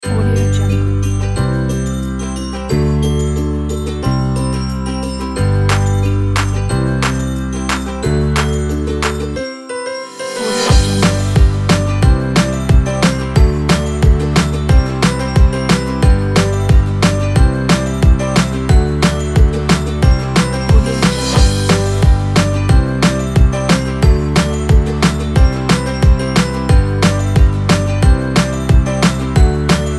funny happy

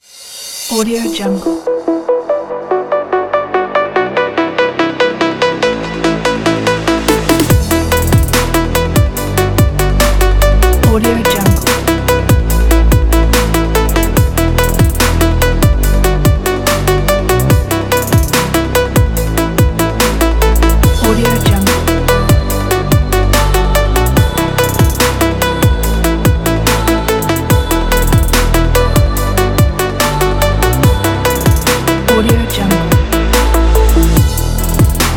trap hip hop beat

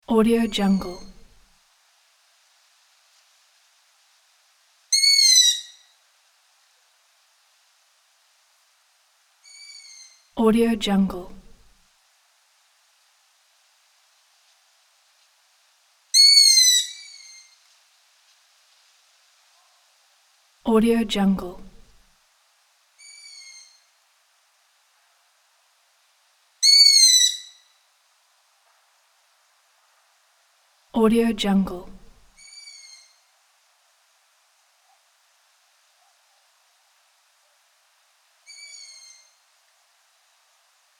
night owls in the city ambiance

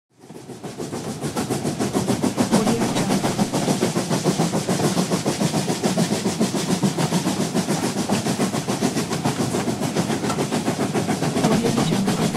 steam train ride

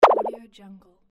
throw

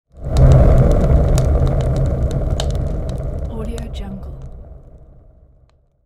fire transition

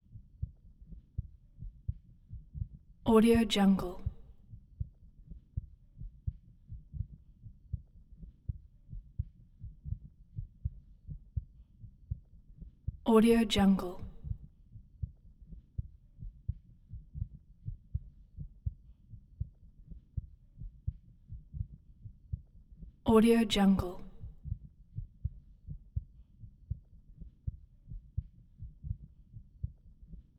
female heart beat 80 bpm

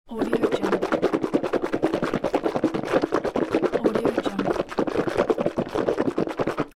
mouthwash

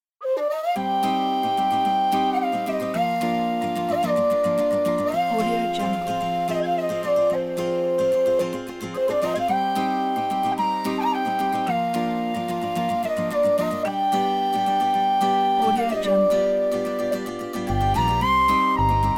country journey

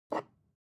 object bottle glass pick up short

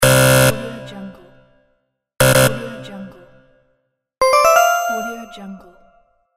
incorrect correct answer sounds

